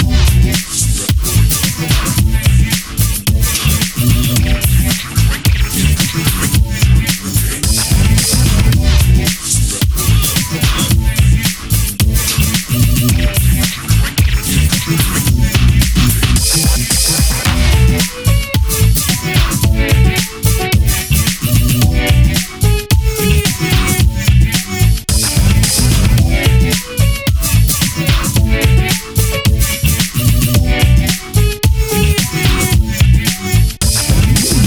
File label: Lets get scratchin Loop; Scratchin' Melodii; Sage 2022 Demo